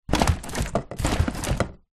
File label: cardboard box push items in squash; zapsplat